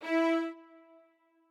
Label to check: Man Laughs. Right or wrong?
Wrong